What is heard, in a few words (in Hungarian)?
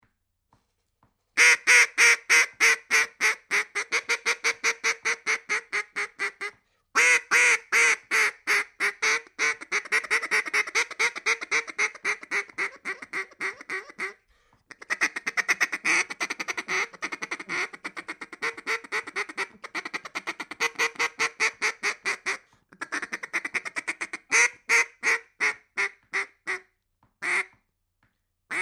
kacsa; vadhivosip